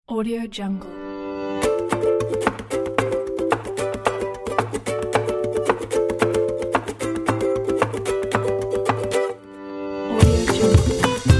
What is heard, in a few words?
happy kids